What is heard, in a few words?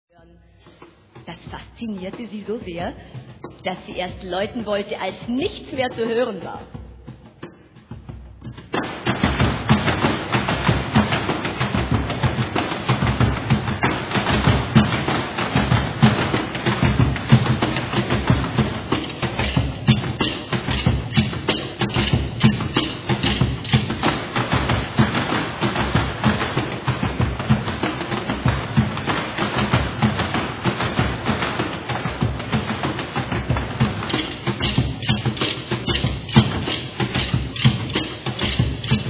Horn; Gacha